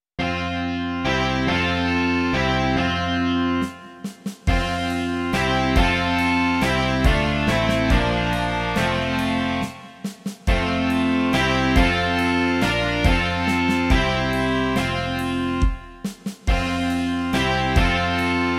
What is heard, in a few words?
rock